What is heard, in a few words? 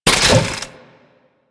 Roller Deploy; Enemies; Warframe; Kela De Thaym